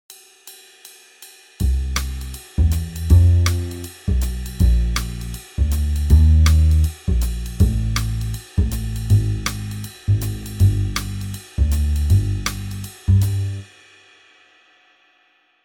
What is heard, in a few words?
Jazz backing, Guitar